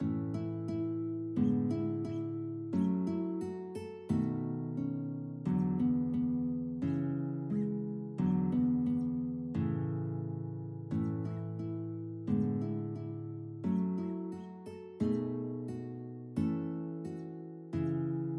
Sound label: guitar duet